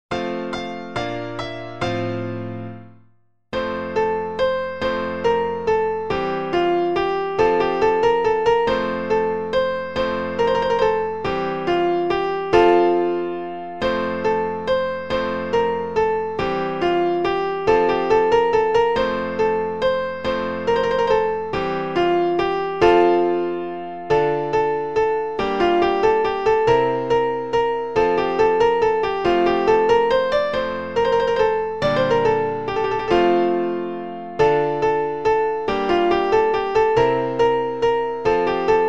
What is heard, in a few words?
Minuet; Footes Minuet